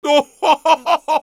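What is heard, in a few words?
laugh short, Spanish; Risa corta; Heavy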